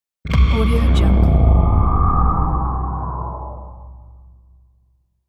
deep impact whoosh

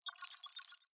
modular ui fm solo bleeps; soundmorph

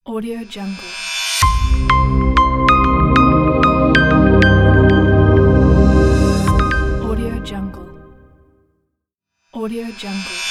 business logo

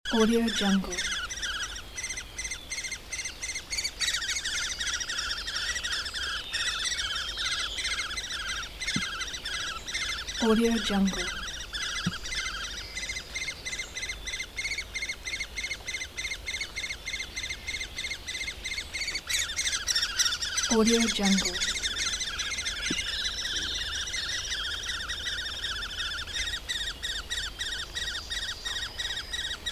woodpecker nestlings in tree hollow

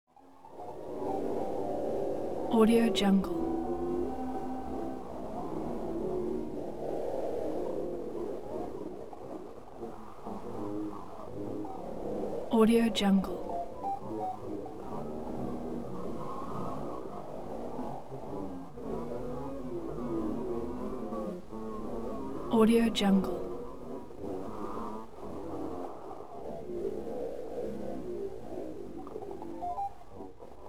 tension broken scanner voices; soundmorph

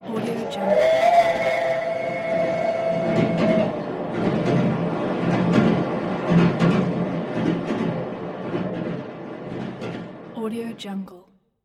train horn pass by

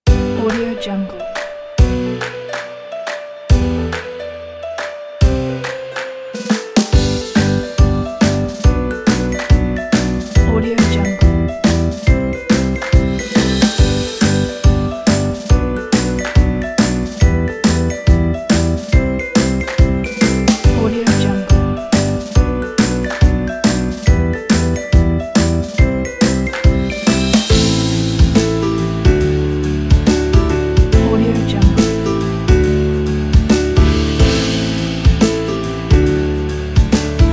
it is happy; brownbird

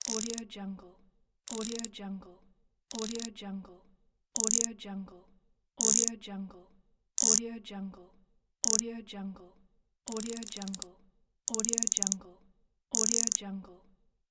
ratchet mechanism

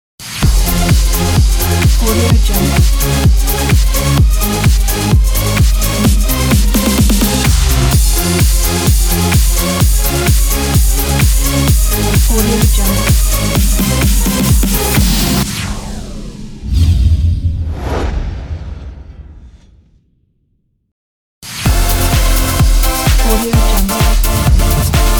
dance logo pack

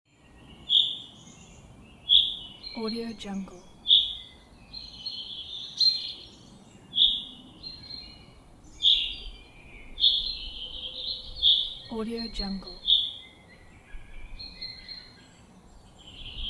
forest birds

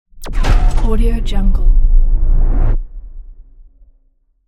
magical ability

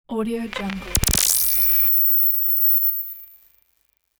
defects in components device

